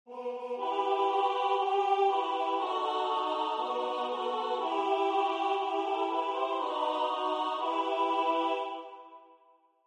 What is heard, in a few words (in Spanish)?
La Tarara retrouso 4 voces